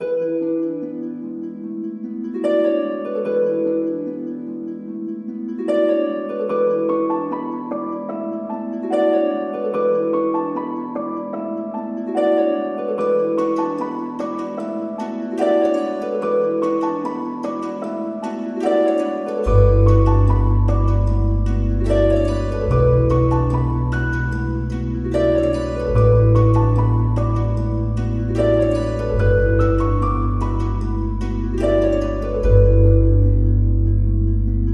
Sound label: Plucked